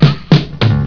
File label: drum bass